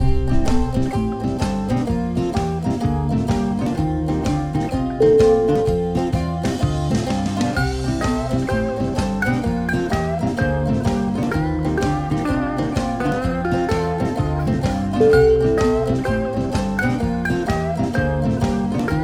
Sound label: happy blues to you